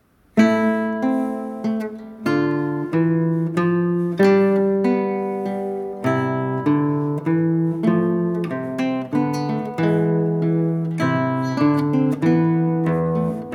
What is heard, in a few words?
Minuet, guitare; Krieger